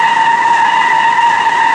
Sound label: SQUALL, SURFACES; ImmersionRacing